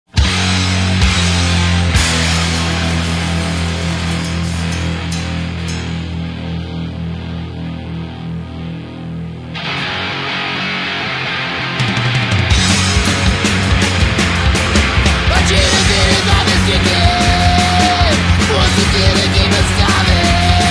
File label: deathmarch